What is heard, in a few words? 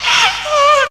SEX; Zero G; Man Machine